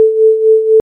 volume sine 4hz

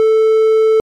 triangle f440hz

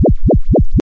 freq triangle f4hz

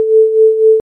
volume sine rev 4hz

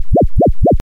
freq sine rev 4hz

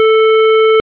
rect f440hz

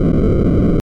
random f880hz

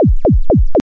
freq saw 4hz

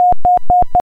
freq rect 4hz

